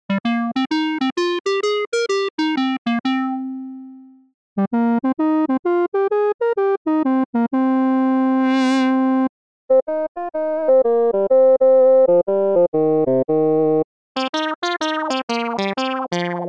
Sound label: sh 2000 bank; obx